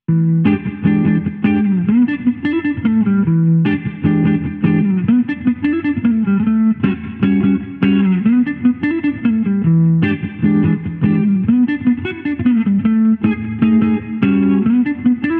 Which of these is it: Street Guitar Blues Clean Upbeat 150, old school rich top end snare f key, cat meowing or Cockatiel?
Street Guitar Blues Clean Upbeat 150